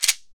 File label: SHEKE, Afro Shakers, Shekeres